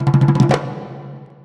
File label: Unlock Extra